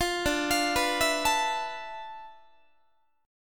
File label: D Minor 6th Add 9th down Guitar; Dm6add9 arpeggiated; JGuitar